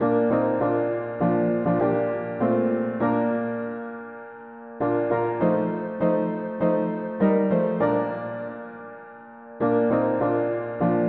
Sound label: mono piano; hymns